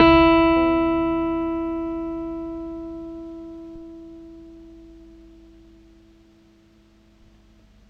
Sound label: Bass E, Stick; Giga Samples Collection; BassSide